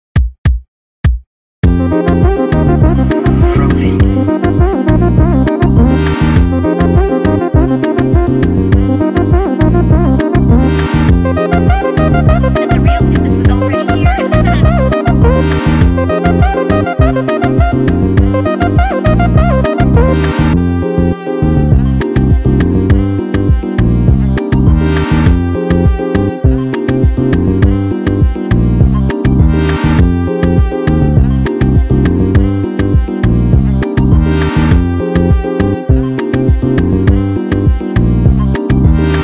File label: Afro Jazz sped up